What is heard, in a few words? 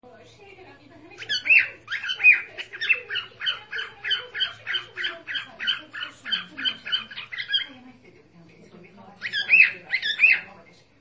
cockatiel; chiko